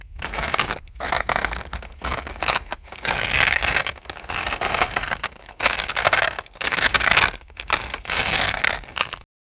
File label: the sound if an ice